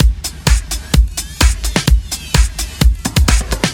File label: House Beat, 128 BPM